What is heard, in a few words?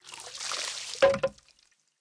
shishiodoshi